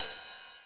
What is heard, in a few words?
ride, Roland MC-909